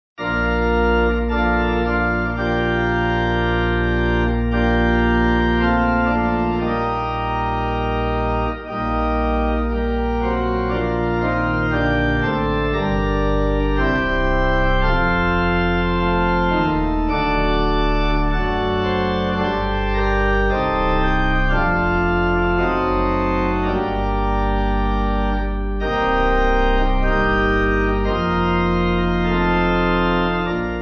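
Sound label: Pipe Organ, Music Backing Files for Churches; None Other Lamb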